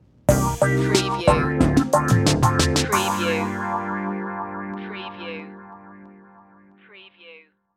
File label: mellow retro stinger